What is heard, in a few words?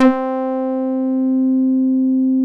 SH PADS; Pro-Rec - Synthsonic; JV PADS